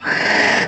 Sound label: HURT